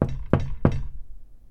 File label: knock on glass door; knocking